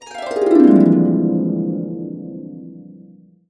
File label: BB7, Harps; USB Soundscan vol.62 - Complete Harps; AKAI